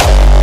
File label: kick